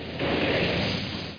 MISSILE

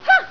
Mean Kick Girl; Fist Fighters

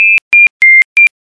uk seize; FoneTone2000; Phreaking⁄Wardialers

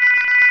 ufo; BROWSER

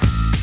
Menu Popup; Above the Rim

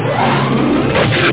ion cannon; ion cannon iffedit; SCI-FI Sensation v2.2; apc mission

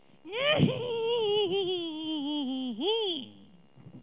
woo

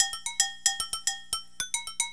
tribal gourd; SGI O2 Out of Box Experience; jungle Island; Cyber Gourds

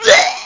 die

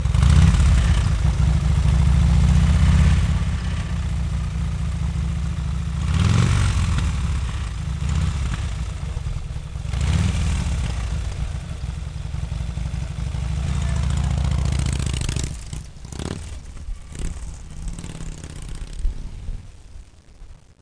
HARLEY

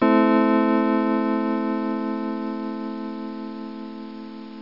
synth

